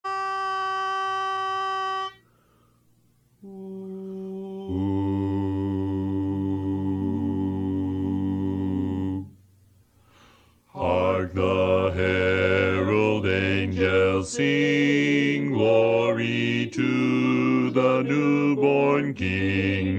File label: Bass